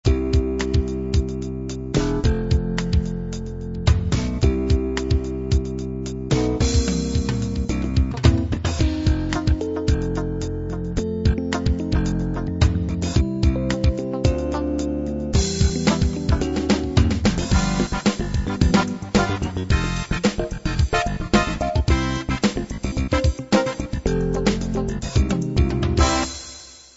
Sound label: smooth jazz